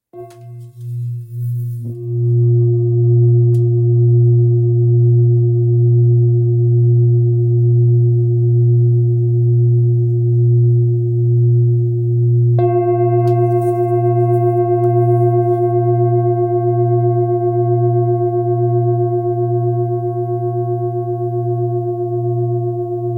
Bol bengale la diese

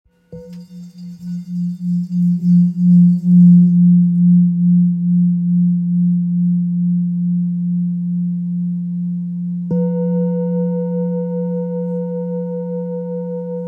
Bol ramgandi fa

